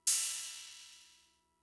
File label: Cymbal, Fricke MFB-522, Drum Machines